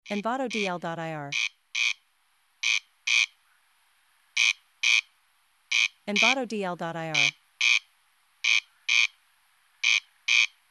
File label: Corncrake Vocals; آواز کورنکرک; افکت صدای آواز کورنکرک; nature sounds